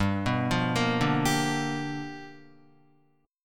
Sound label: G 7th Sharp 9th G, Guitar; G7#9 arpeggiated; JGuitar